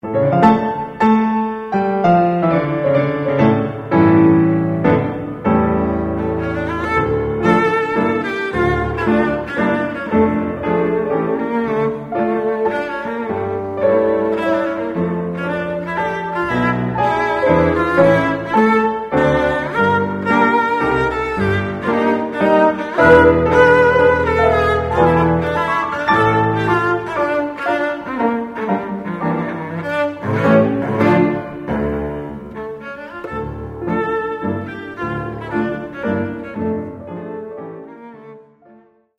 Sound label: mazurka